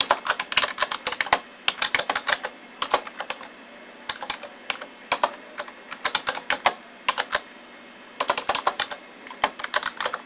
typing

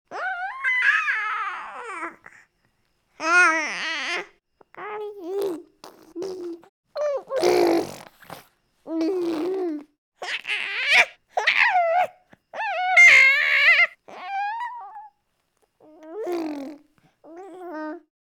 baby vocals; Asian Show Sound Effects

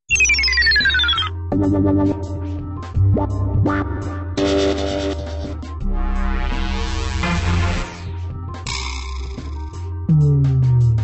Synth Samples

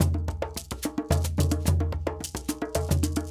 Djoun, Djembe, Shaker

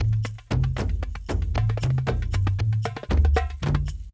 Kendong

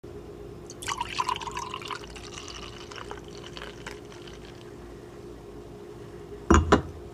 water pouring

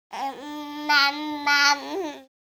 baby talk; Asian Show Sound Effects

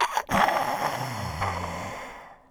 shoick

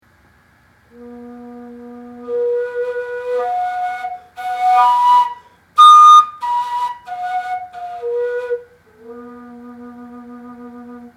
koncovka open voicing

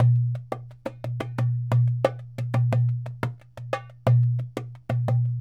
Dumbek

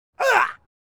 male grunt punch; Butterfinger Video Sound Effects

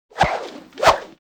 swish double swish; crashes swishes impacts; Asian Show Sound Effects

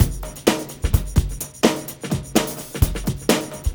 Organic Drums

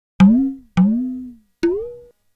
electronic bongo; music electronic; Asian Show Sound Effects

